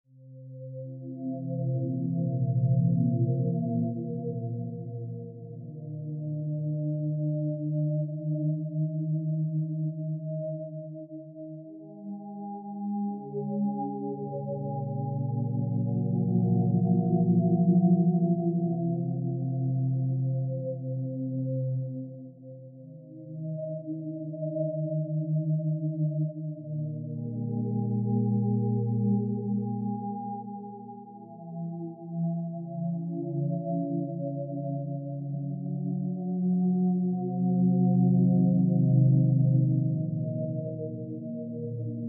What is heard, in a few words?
Whales; ambient